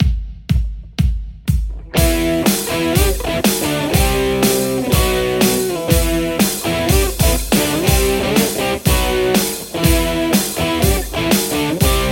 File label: Les Paul; poulin legion